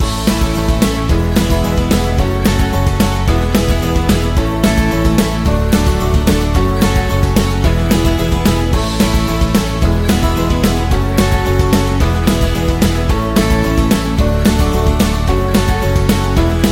Funny Loop; cooking show

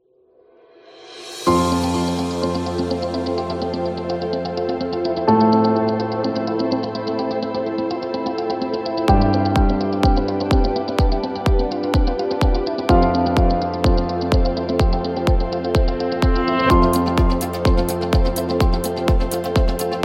Successful Presentation

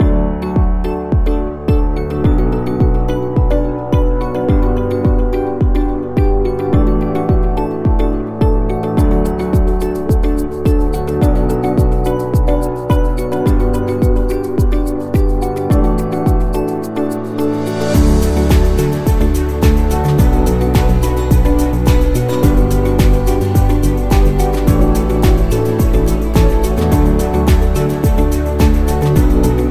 Simple Positive, corporate music; corporate music vol 6

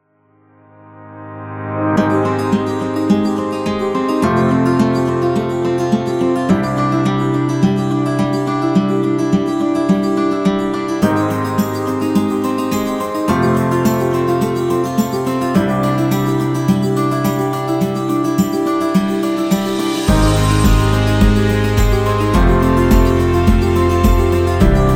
Melancholy

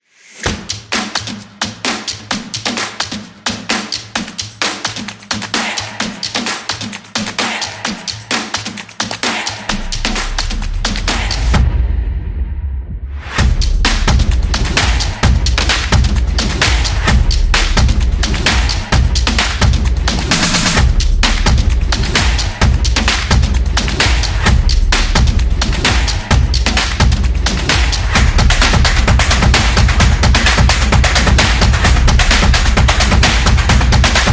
Drum

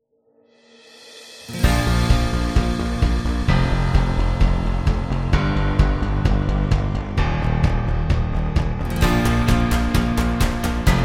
Epic; Winner

